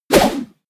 whoosh throw

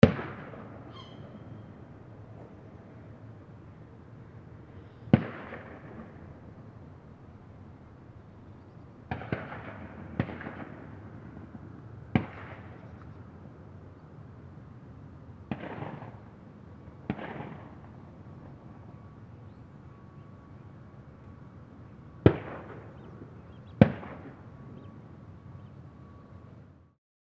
duck hunting

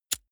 lighter wheel spin

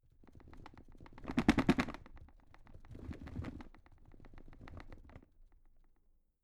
drawer cabinet carton rattle

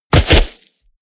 body fall snow hard bounce

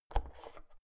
oven knob press in

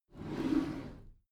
packed drawer smooth slide close

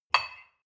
dishwasher cup place

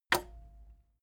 cassette eject push heavy

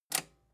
metal button robust spring push enable

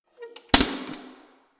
letterbox metal push close hard

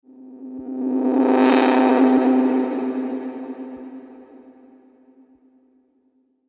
scrapish tone swell

